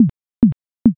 slot stop

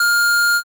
censor beep

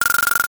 lever

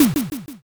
kick ball

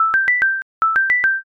mobile ring

